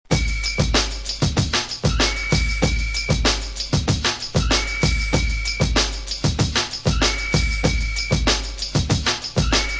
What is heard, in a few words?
drum loops